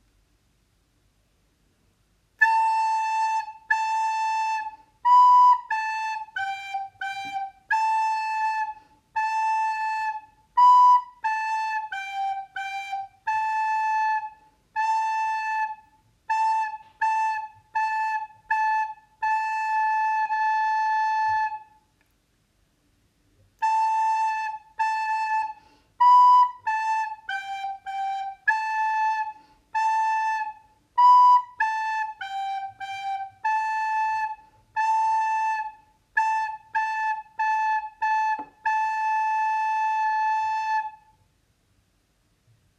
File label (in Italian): esercizio flauto; musica attivita svolta durante la didattica a distanza